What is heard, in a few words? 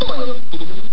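background; barn doom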